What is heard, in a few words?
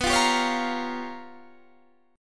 G 7th Sharp 9th Flat 5th